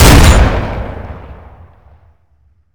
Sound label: shotty lmg; garrysmod